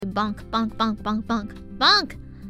bonk

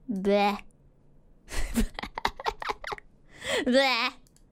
Bleh; noises

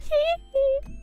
hee hee

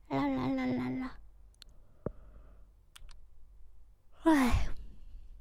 Yawn

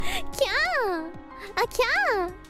kyan kyaaan

